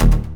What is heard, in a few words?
Synth Bass; Vengeance Samples; VEE Synth Shots